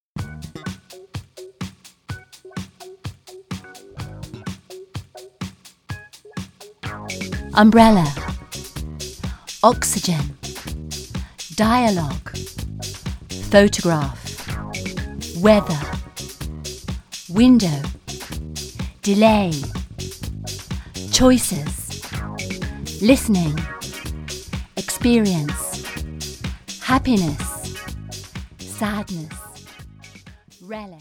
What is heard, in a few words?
chants